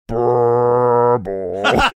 making alphorn sounds

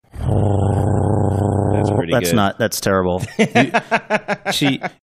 attempting drum roll

